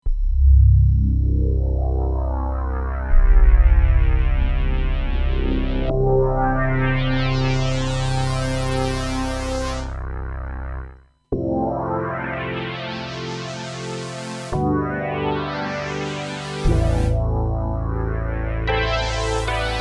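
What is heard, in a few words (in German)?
weich filter, synth; jx8p; moogulator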